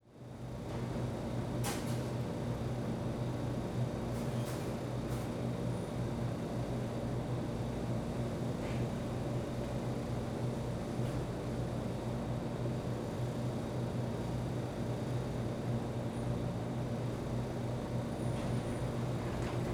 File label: Ambience Store Entrance Near Vending Machines 180; AdobeCC